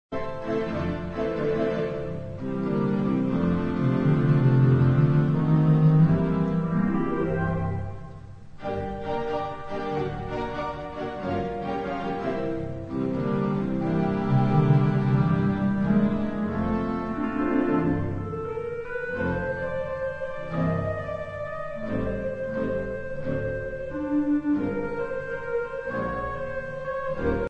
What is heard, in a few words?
theatre organ